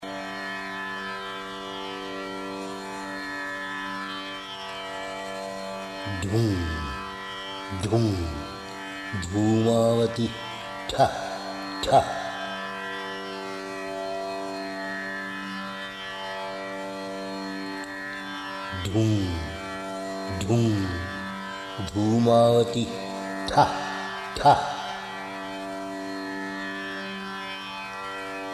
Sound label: mantra; dhumavati